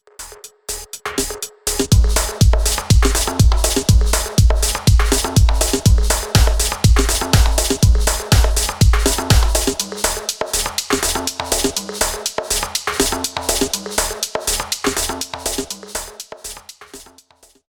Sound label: Perc Dance; fxpansion; Bonehead